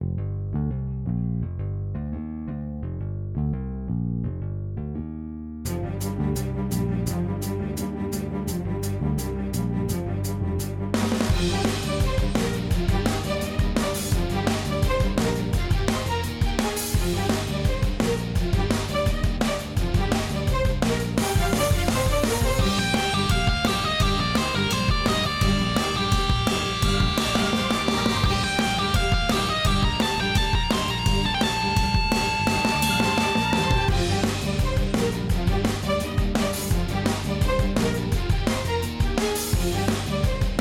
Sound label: battle PVE BOSS bg; little big hero big hero dream android game music